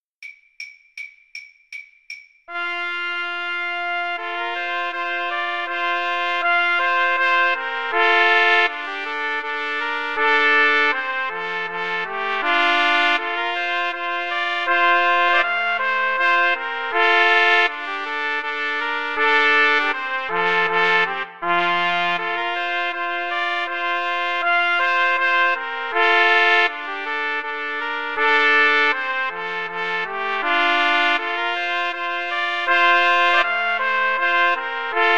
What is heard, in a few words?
slavonic, Trumpet Trio